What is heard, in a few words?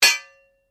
Blade Heavy Moving Impact Ring, Sword; Foley sounds for melee weapons; Swords; Combat; SoundEffects-Foundation1